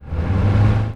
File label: Rollover Engine, sound effects; Among Us